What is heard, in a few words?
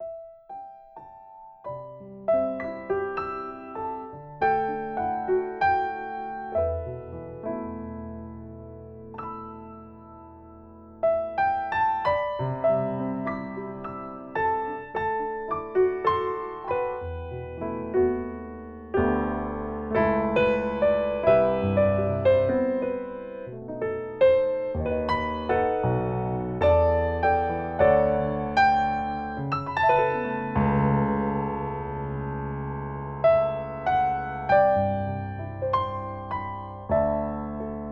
Piano Ver.